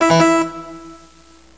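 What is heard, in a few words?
HARPSICHORD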